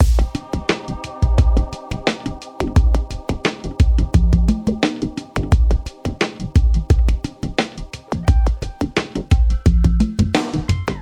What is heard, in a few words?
87 bpm Classic Breakbeat A Key, Free drum loop sample - kick tuned to the A note. Loudest frequency: 508Hz